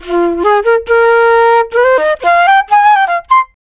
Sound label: flute, D brass licks